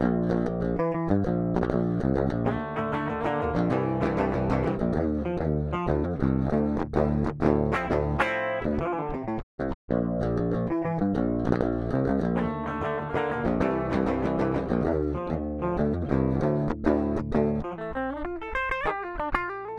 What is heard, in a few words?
Guitar Smart Comp